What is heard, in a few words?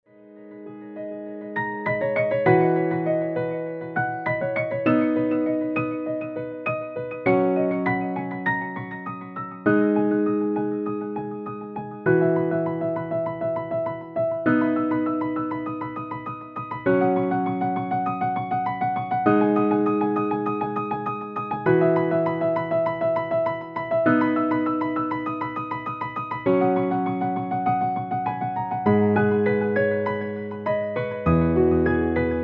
Emotional Piano